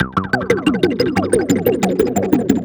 (arp) serge; Sonic Foundry (Sony Creative Software); Ugly Remnants VOL-2

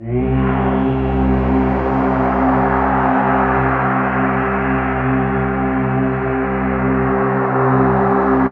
Pad; Dream Zone

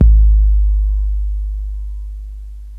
Bass Drum Sub Long Hiss; Lost Tapes From Mars

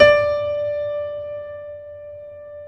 PED FF, GRAND PED

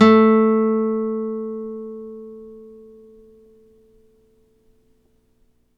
NYLON F A2, GUITARS; Synclavier Sampler Library

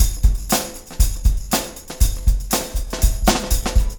Room

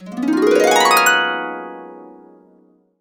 GB MAJ, Harps; Complete Harps; USB Soundscan vol.62; AKAI

